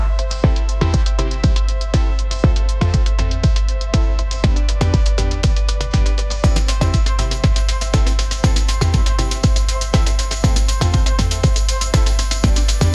upbeat pop melody

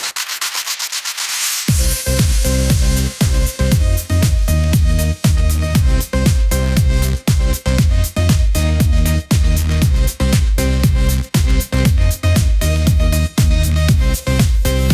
stardust dancefloor energy, ringtones

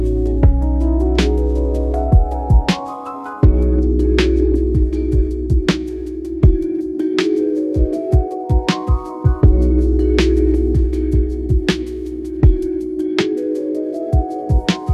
lo fi hip hop

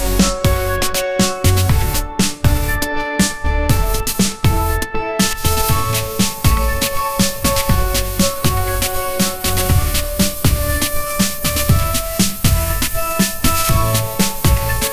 pop groove, ringtones; ai

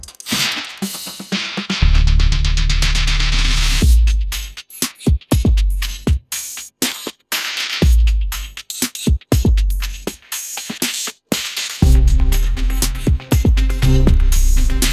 catchy phonk pop groove, ringtones

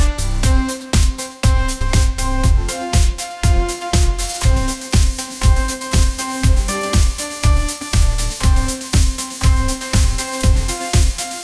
pop adventure

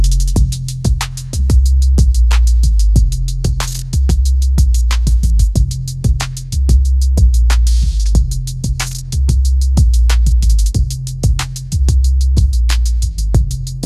midnight chill trap groove

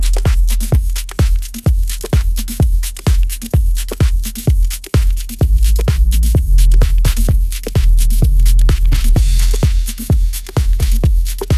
mellow drops and chill grooves

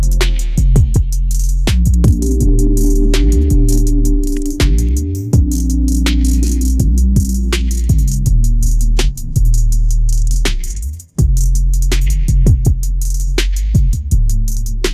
midnight velvet groove, ringtones

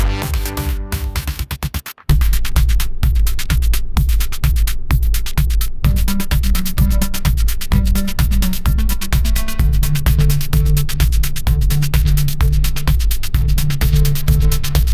bass drop ringtone edm; ai

